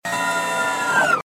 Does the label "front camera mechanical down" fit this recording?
Yes